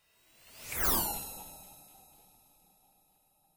SCI FI VEHICLE PASSES; Various Sci Fi vehicle effects; Spacecrafts

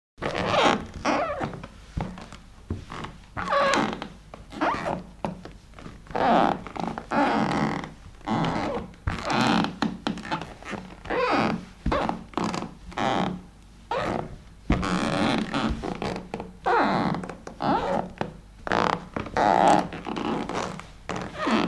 Footsteps walking on wooden floorboards; Creaky Floorboards; Human

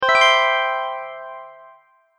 Open Application, beep sound effects

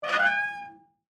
Safe Door Open, Office, Large, Metal, Squeak; Metal Door Sound Effects; BlastWave

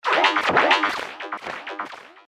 Robotic Future Frenzy; Robot Sound Effects; science fiction sound effect; star wars / trek style games

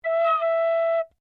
RECORDER MISS, cheap plastic school recorder; Miscellaneous Musical; Sound Effects:Musical

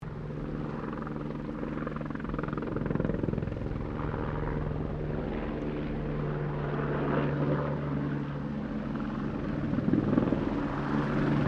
helicopter Bell 204 firefighter passing medium; Helicopters; Vehicles; Aircraft Collection

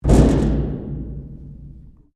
Strike Metal Hollow Tank Rattle; Materials

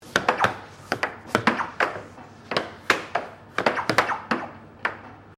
Air Hockey Rally, Air Hockey table; Air Hockey (Sound Effects:Games)

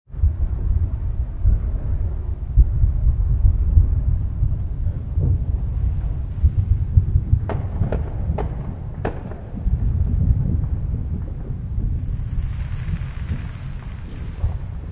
Fireworks, Neighborhood fireworks.; Vienna VA; Part of Fireworks (Sound Effects:Backgrounds)

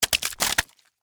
Splat Guts Fall, Wet, Juicy; Horror; Blood and Guts; BlastWave

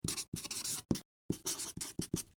Office Marker Write On Cardboard; Writing; Human

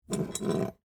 Skeleton Key Up, Pick; Foley; Jangling; BlastWave

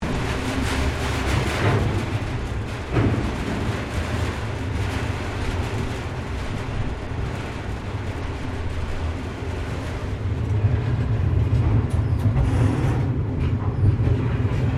Train Freight Bridge Crossing; VICRAIL; Various Melbourne Trains; Vehicles

